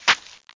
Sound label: SPLUTA; AB3DII